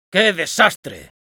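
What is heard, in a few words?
jeers, Spanish; "¡Que desastre!"; Spy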